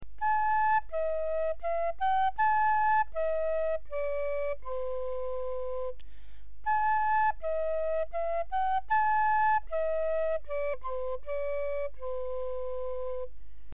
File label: flute